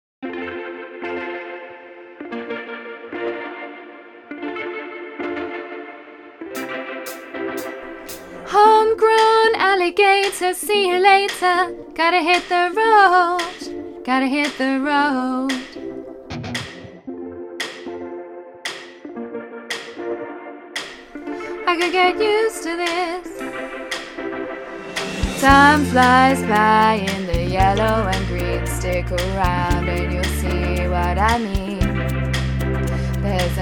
tenor

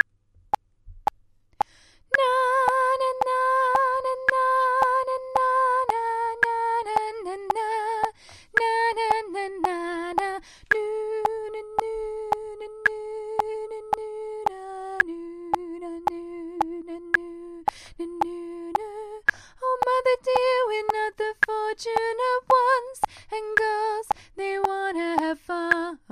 soprano top part

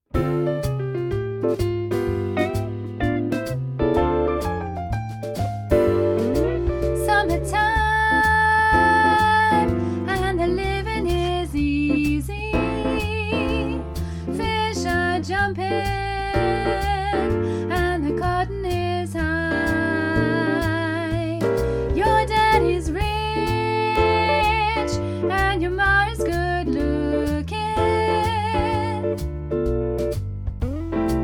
soprano

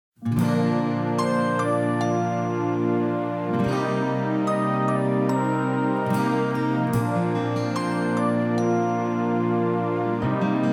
soprano, choir

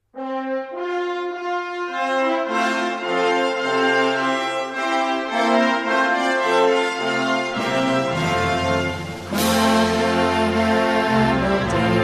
Hark The Herald Angels Sing tenor, choir

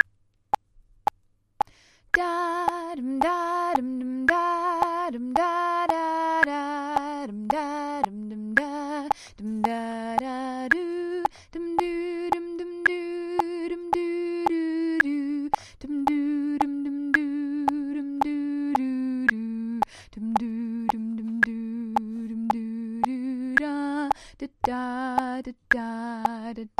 tenor bottom part

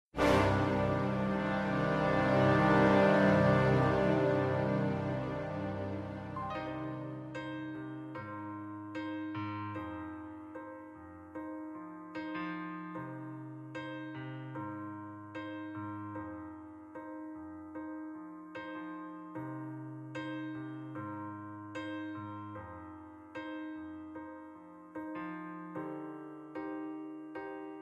tenor

